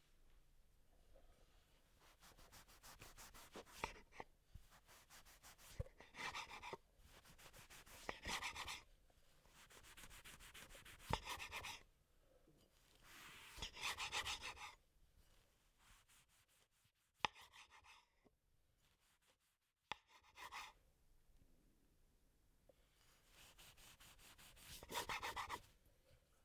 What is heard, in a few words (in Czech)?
Rezani Masa